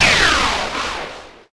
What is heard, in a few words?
TUNNEL wave crackle